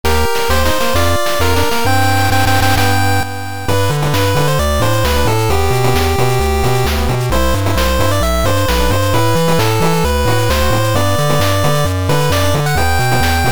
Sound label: 8bit Fanfare